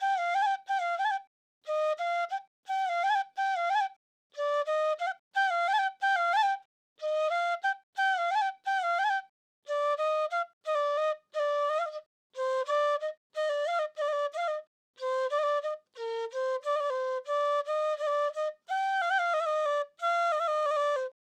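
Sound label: Trap Flute D major 90 BPM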